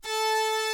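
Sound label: Synclavier lead; Greytsounds; F2-SYNCLAV 2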